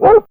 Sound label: Dog